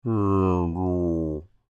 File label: Creature Speak Groan Med, Human; Groaning; BigRoomSound